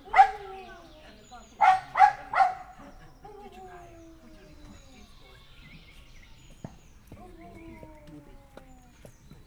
szamár patadobogás is, mudi; szegedi zoo 2011

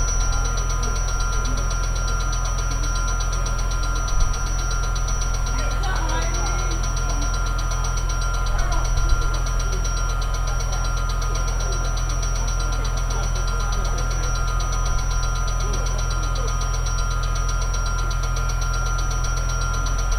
jelzőberendezés hangja vasútállomás

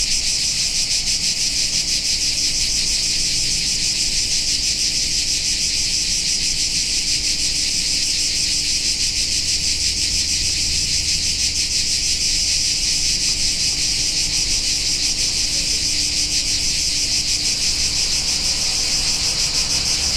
belehuztak a kabocak

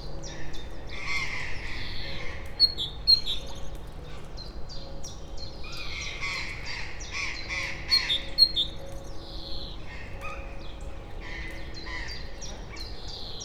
szabadon levő, széncinege; debreceni zoo 2012

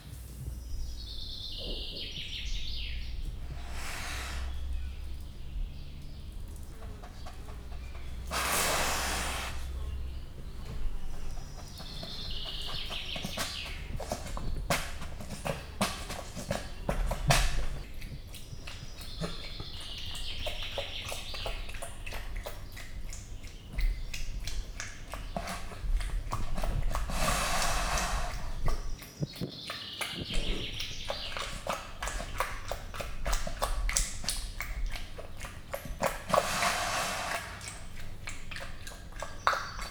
lakmározás eleje kisebb zörejek, sörényes hangyász; jaszberenyi zoo 2015

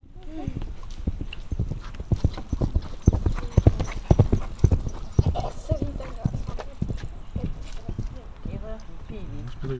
vagtato lovas beszed, lo; állathangok; paraszt udvar

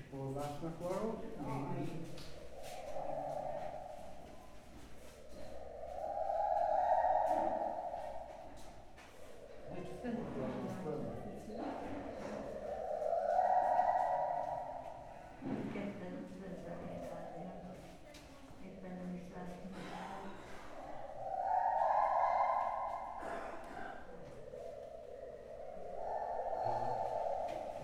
majomházban plexifal mögül, fehérkezű gibbon; debreceni zoo 2012

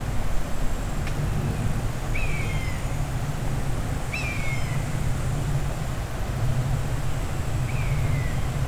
egerészölyv; termeszetben

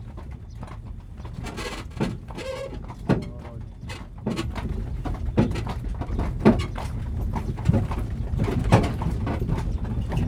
szekerezes, lovaskocsi hortobagyi puszta

